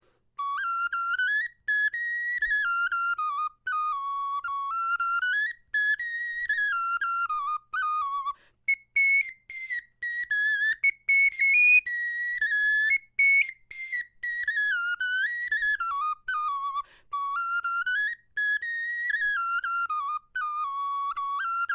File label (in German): melodie